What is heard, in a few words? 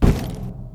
metal vent, footsteps; player